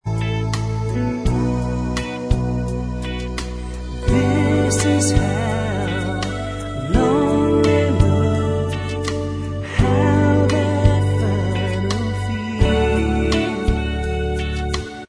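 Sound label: backing vocals, backing tracks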